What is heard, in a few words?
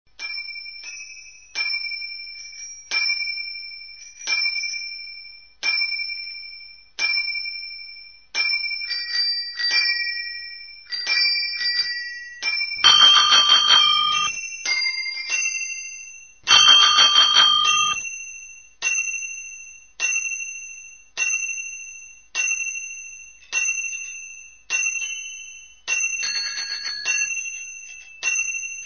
frogs Frog Pond; rumori ciclici